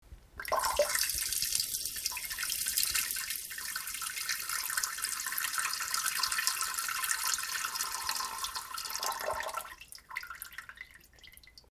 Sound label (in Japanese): しょおおばちゃちゃ おしっこ